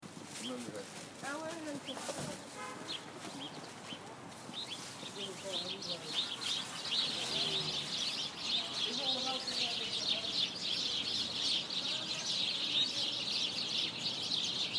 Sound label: birds